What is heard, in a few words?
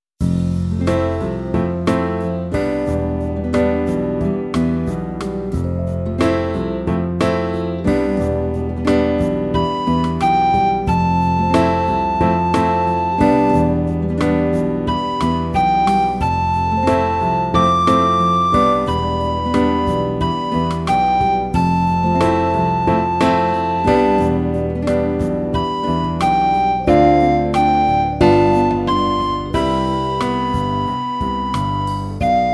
Practice, recorder